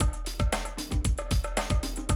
Classic Drum Loops

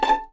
A5 PIZ MF, string; East West; The Ultimate String Collection

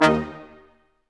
BRS Quintet staccato; Roland L-CD702

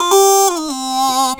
Ooo; Mo' Phatt; Digital Sound Factory

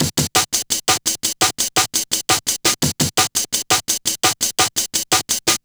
Kickin Break, Drum & Bass, Beats & Breaks; Fluid Dynamics

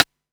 DDD-1 RIM, drum kit; Polyend; Stazma & Concrete Collage; Concrete-DDD1

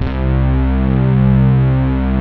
SYN E KEYS, pads; Pro-Rec - Synthsonic; TR PADS

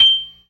PERC, ORGAN; Keith Emerson Signature Series

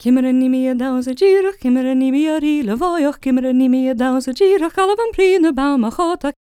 GAELIC, World Voices, GAELIC FEM

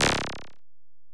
EPerc, Alesis DM-PRO

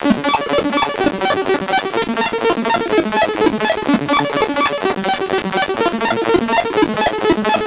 ghost machine Dm 125, synth loop; Zero-G; Soundclash; Nu Dance Electro

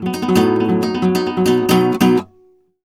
guitar B, Flamenco Guitar Arpeggio; Best Service ProSamples vol.46 - Flamenco

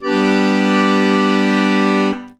AKORDE G DUR; ACCORD.CHRDS

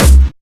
Distortion Kicks, Bassdrums

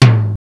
Ensoniq EPS Tom

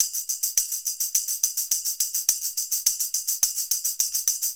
Tambourine; Sonic Foundry (Sony Creative Software); Indie Rock Anthems; Session 02 - Clinical

